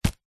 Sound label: leisure toy slingshot rubber band shoot